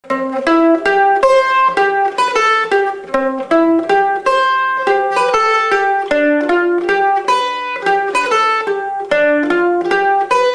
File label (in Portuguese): Bandolim